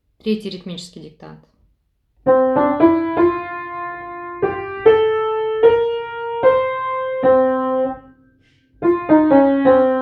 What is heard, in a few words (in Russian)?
ritmiceskij diktant